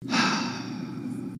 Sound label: huuh, sighhh